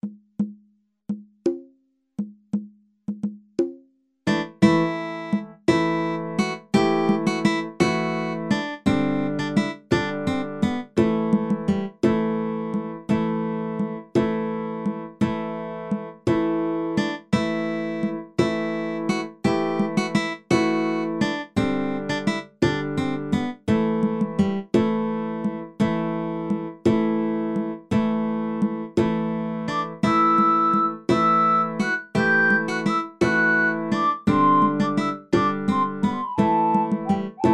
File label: City Tavern